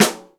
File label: HI EDGE DRUM